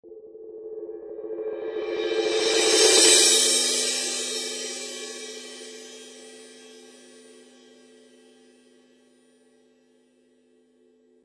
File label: Solid Crash